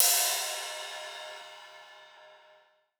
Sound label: Ride Stacked; Drums